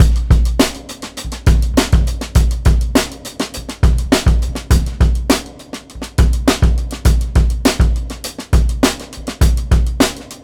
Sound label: Break Funky 102 bpm, drums; Loops; Ableton Live 12 Lite; Core Library